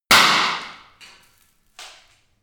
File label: party popper; explosionen